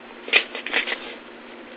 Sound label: click; REMIXABLE COLLECTIONS; Ze Franks Pain Pack; pain pack percussive and bips and clicks